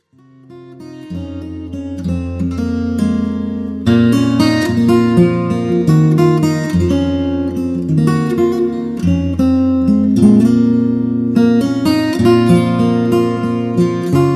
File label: Irish Unplugged